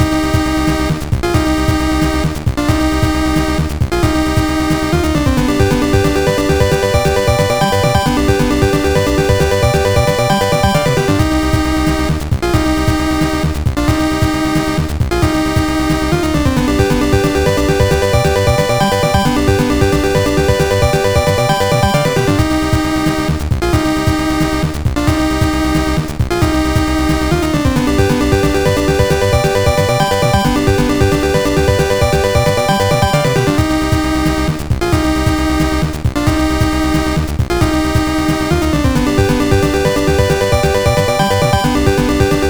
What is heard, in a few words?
Warning Warning; ビッグファイター